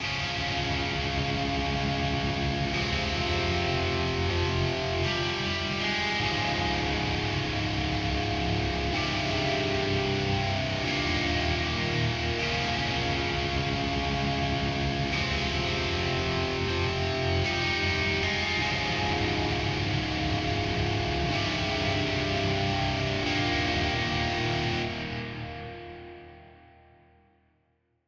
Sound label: LOUD ROCK LOUD GUITAR